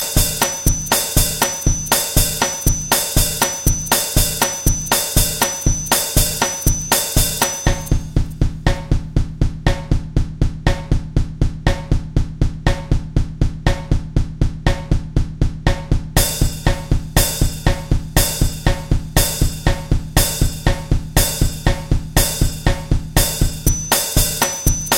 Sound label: American Folk Rock